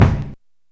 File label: rich mids bass drum c sharp key